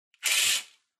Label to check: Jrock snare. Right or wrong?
Wrong